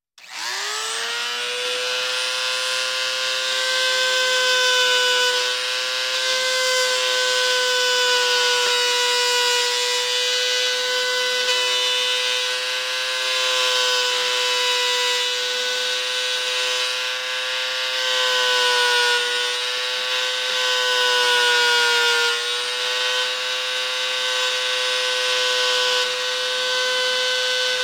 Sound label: Variable Speed Muti Tool, On, Run, Engrave; UberDuo; The Workshop Audio Playset